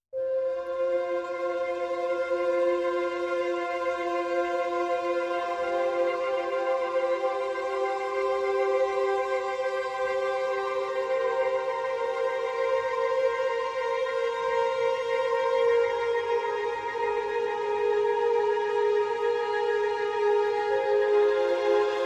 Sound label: Strings Mix